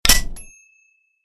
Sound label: Switch